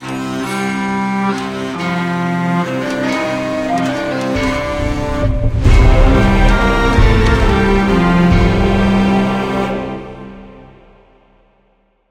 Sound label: music puzzle melody; ornn